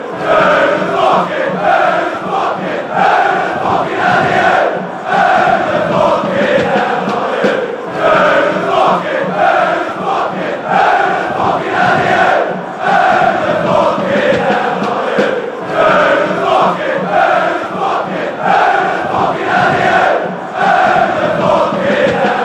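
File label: complation of lincoln city chants, football chants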